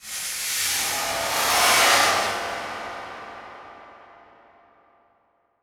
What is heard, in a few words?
Transition Stab Dark Medium; Production Element; Title Transition; AdobeCC